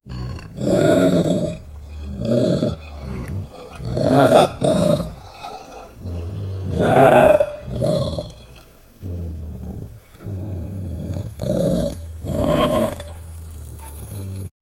ogre growling and slicing, axe

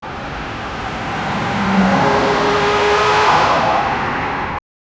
sound of a road traffic, alert

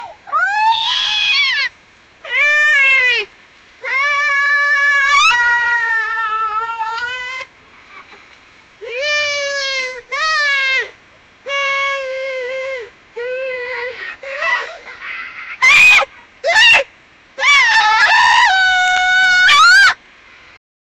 screaming loud; judy hopps; animation

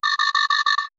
simple error sound

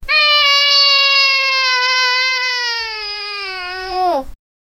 kids crying

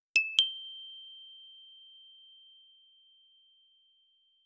a quick satisfying pop wi

